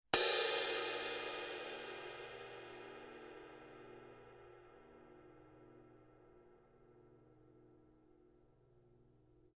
Color Sound 900 Black Ride, Paiste

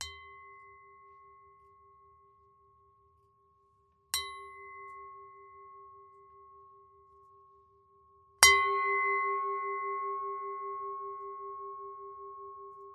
Paiste 09 PSTX Pure Bell; سنج بل پایست مدل pstx pure bell سایز 9 اینچ

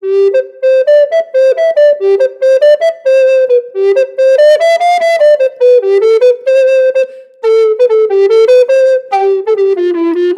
Traditional Yamaha YRT 304 B II Tenor Recorder

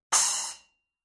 10 HHX Evolution Splash SABIAN Cymbals; 11005XEB

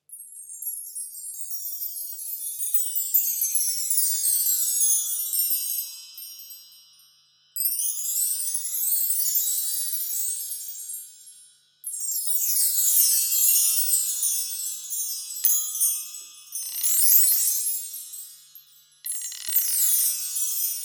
Meinl CH66HF Chimes

